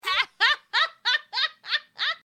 laugh

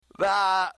gaaah

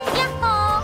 yahoo; venti

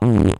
fart; Sh*tty Jim – Fart 14

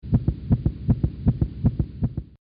heartbeat

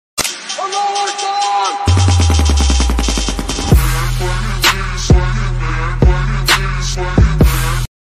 donate

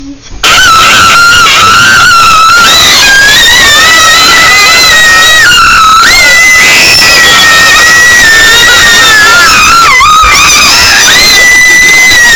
kids screaming lol

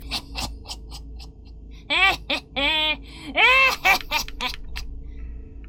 hehhehheh

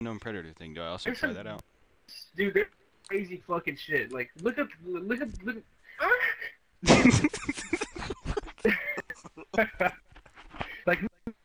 moan ghost

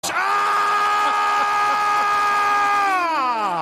aaaaaaaaaaaaaah

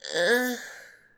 moan

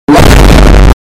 pow; sonic

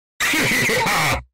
HE HE HE HA Loud

